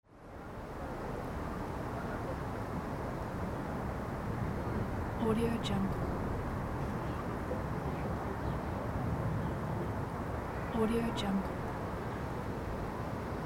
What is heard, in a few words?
city ambience; tr-sound